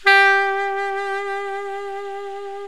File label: BREATHY SAX; OMI - Universe of Sounds